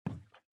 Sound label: run wood; gamerip; Out of Reach: Treasure Royale